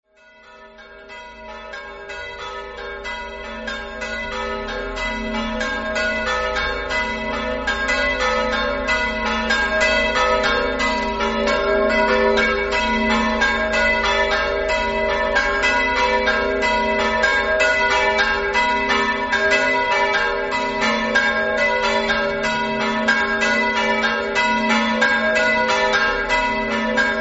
case, campane

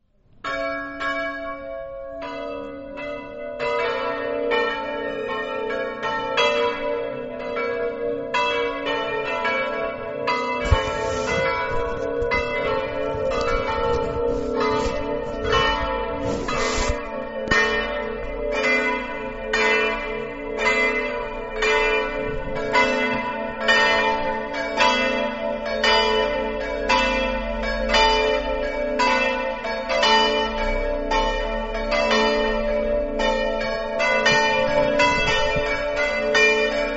fraforeano, campane

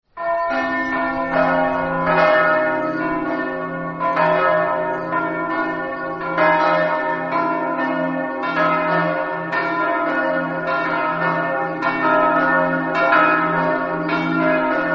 ragogna, campane

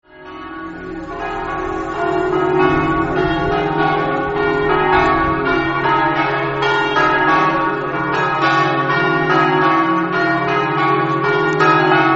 campane, Osoppo

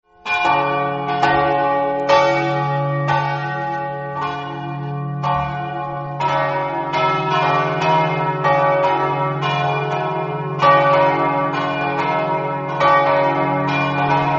san floriano, campane

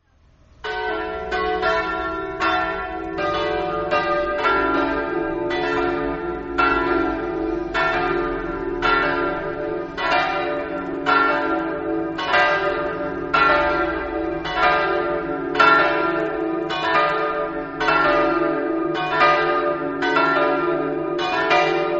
sclaunicco, campane

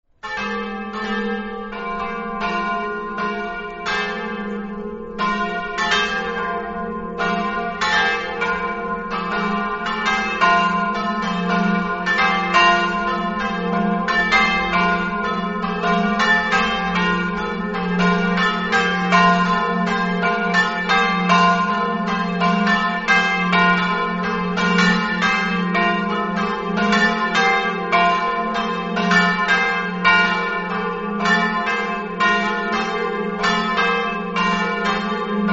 campane, Mereto

